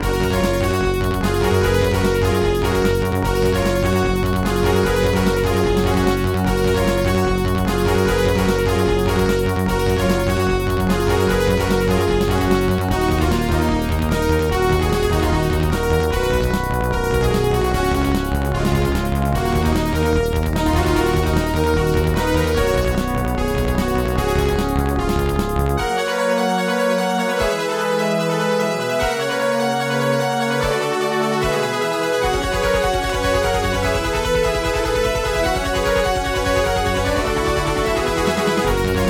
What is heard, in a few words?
aztech sg waverider32; Soundcard test page; AZTech SoundGalaxy Waverider 32 Plus; tyrian